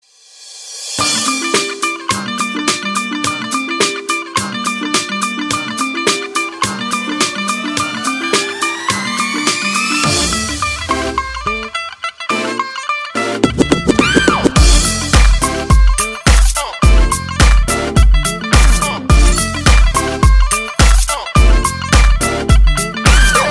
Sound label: instrume